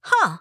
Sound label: pick